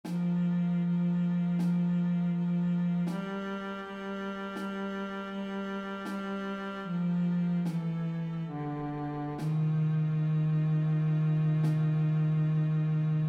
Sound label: Baritone